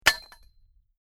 Metal Impact Rock Drop On Metal Pipe; Stone slab, brick or cynder falls on solid metal with a good clank

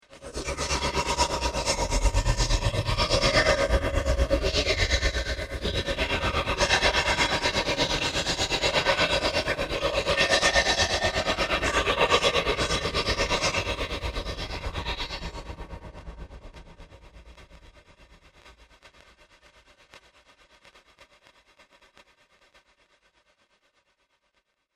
Evil Cometh, horror

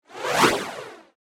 Whoosh Flange, Fast, Pass By, Transition; Production, Element, Imaging, Accent; Whoosh Sound Effects

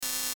ERROR GLITCH BUZZ; Electrical Button; Multimedia; Electrical Buttons; Blastwave

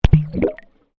Water Microphone Dunk Shallow Submerged; Submerged splash sounds; Splashes; Sound Effects:Objects

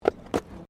Tram Horse Drawn Horse Step Gravel; Horse drawn tramcar; Carriages (Sound Effects:Vehicles)

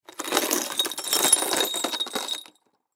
Debris Glass Kick Away; Debris (Sound Effects:Materials); Foley; Blastwave

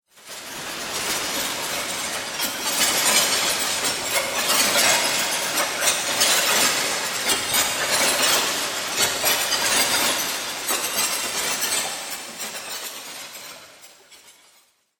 Horror Drone Evolving Lair Of Evil; Scary Soundscape Sound Effects

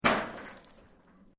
METAL SIGN MOVE; Sound Effects:Materials; Foundation3

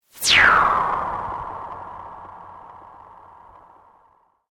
Sweep Mechanical Medium

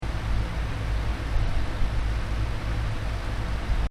Fan: Large Desk Fan Running; Fans; Electronics; Stuart Duffield

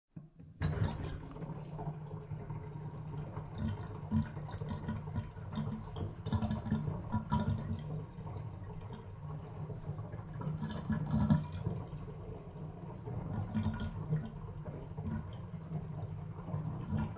Household, Bathtub Draining Water; Bathroom

